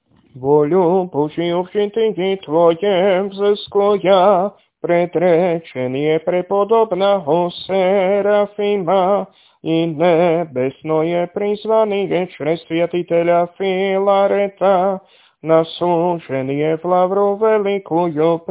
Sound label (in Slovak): Tropár, hlas 3; Tropár Antonovi Radonežskému, hlas 3; nedelna-skola